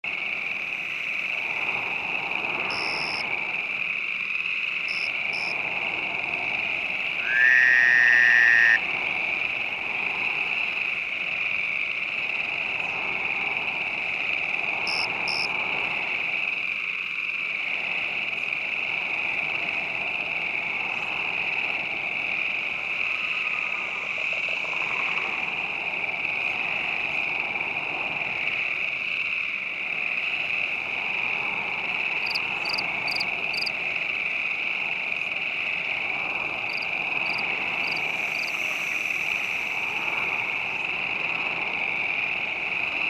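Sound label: Ambience Night Forest Swamp Loop; the unfinished swan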